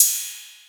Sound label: Sequencing Cymbal; Kit; Feel; MMFeedbacks; SequencingDemo